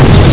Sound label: explosion